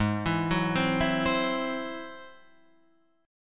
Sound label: Ab Augmented 7th Ab down; Ab+7 arpeggiated; JGuitar